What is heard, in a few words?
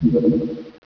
WATR JAM